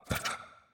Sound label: zombie vox; smite; ahpuch skin10